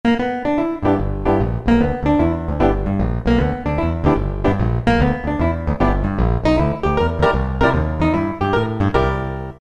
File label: Loud Piano, Roland PN-D50-02